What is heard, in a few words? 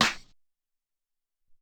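Hop Stick, Alesis SR-18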